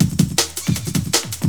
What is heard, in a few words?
break